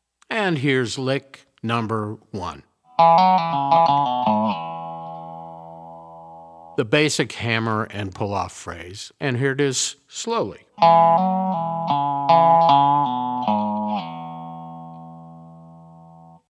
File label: resonator pedal, steel guitar